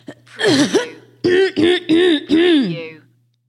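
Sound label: Female Clears Throat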